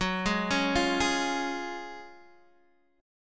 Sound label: F sharp Major 7th Suspended 2nd, F sharp, down, Guitar, DADGAD